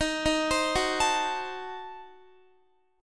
D sharp Diminished 7th D sharp Guitar; arpeggiated

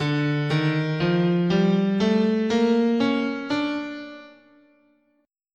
D Neapolitan Minor, guitar, scale

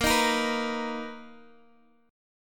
B Major 7th Bb

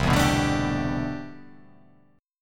C Major 13th C

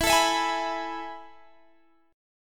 A Major 7th Suspended 2nd

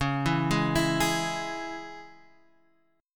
C sharp Minor C sharp Guitar Standard, chord; arpeggiated; 9,7,6,9,9,x; JGuitar

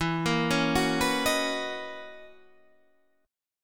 F sharp 13th E

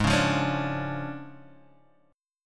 D Minor Major 7th Flat 5th, G sharp

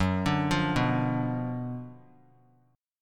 F sharp Major 7th Suspended 4th Sharp 5th; 3C,3F,3A,3D; arpeggiated; 18,21,20,21

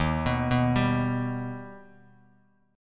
Eb Augmented Eb, Bass Standard; arpeggiated; JGuitar

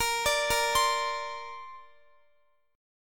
A sharp Major Add 9th, down, Guitar; arpeggiated; tuning 4F,4A,5C,5G,5C,6E; chordshape

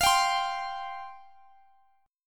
F Suspended 2nd F Guitar Open G

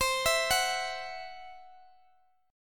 C Major Flat 5th, C, down, Guitar, DADGAD

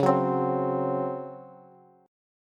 Db Major 7th Suspended 4th Db down Bass; strummed; JGuitar

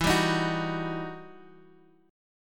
E Minor Major 9th E down Guitar Standard; EmM9; strummed; JGuitar

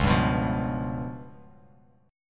Db 7th Flat 5th down Bass Standard; Db7b5 strummed; JGuitar

